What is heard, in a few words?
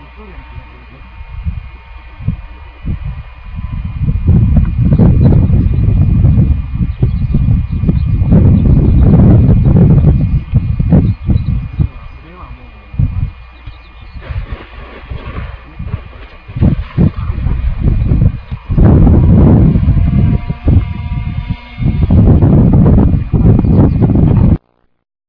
learjet landing